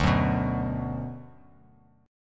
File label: D 6th, Bass Standard; x,5,4,4; strummed; JGuitar